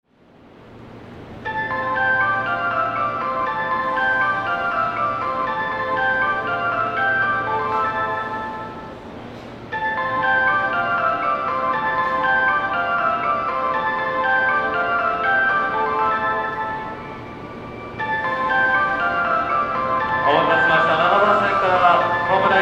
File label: shinjuku melody, Tokyo Train Tunes; japan